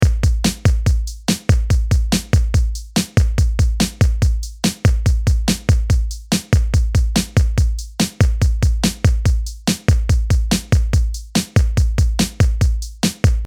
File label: Drum